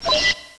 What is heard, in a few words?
guillotine, weapons; guillotine red